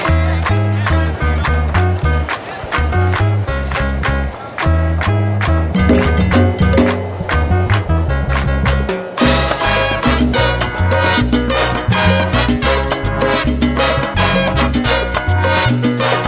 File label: salsa; salsa jvk duo